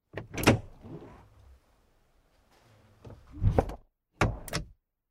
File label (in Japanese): jishin studio door